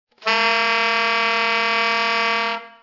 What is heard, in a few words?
multi, sax